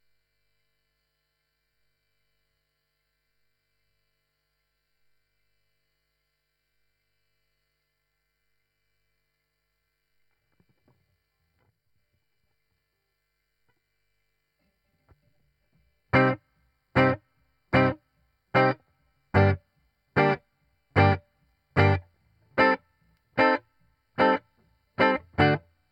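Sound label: guitar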